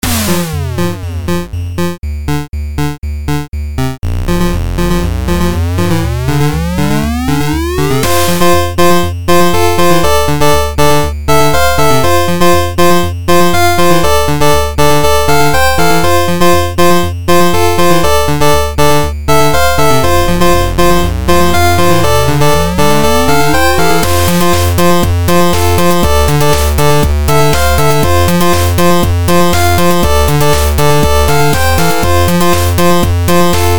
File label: 8bit Song